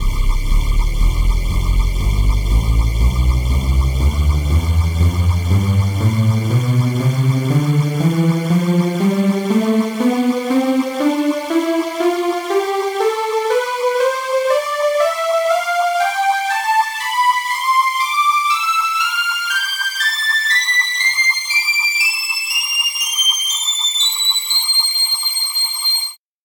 halo pad tonleiter

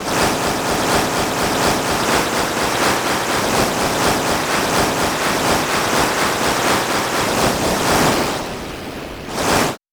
sea shore; bass

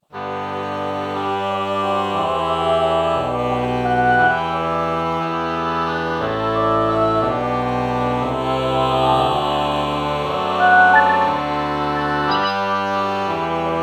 ah oboe lpc true bach morph

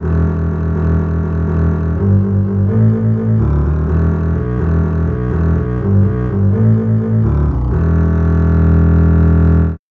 contrabass bass

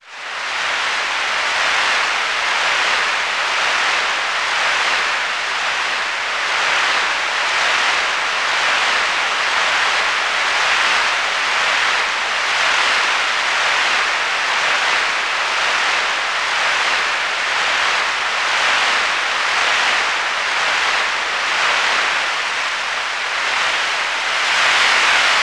applause

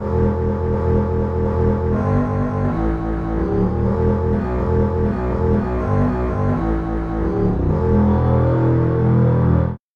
slow strings bass

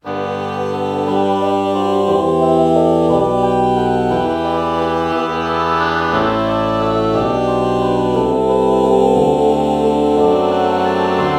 oh oboe lpc false bach morph

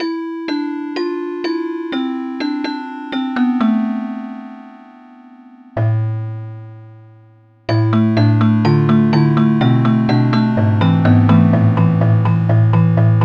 crystal

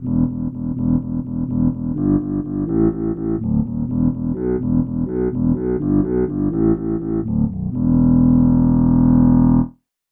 english horn bass

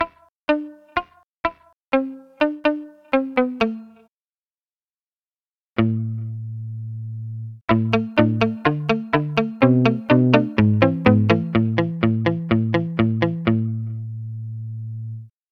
palm muted guitar samples

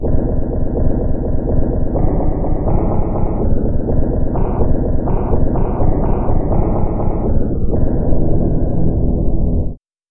orchestra hit bass samples

